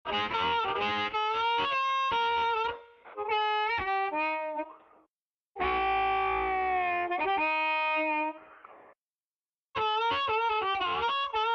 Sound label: solo